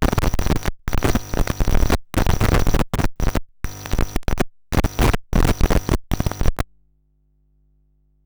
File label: Noise